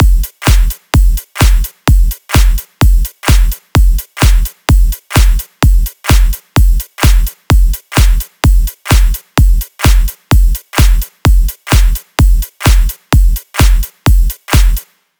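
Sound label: 128 BPM Full Drums, A minor; Vengeance - Essential Clubsounds Vol.5; Demo Constr Kits; Highway Kit; Drums & Perc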